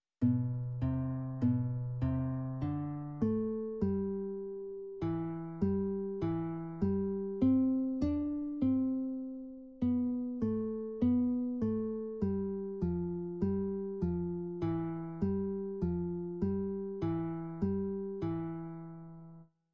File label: accomp; beginner classical guitar lessons